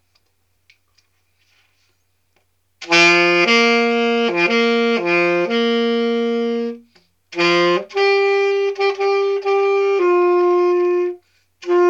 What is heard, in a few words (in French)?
salut saxo